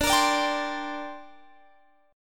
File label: D 7th Suspended 4th Sharp 5th, down, Guitar, Open G